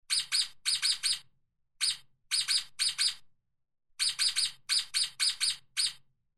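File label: gornostaj golos, stoat voice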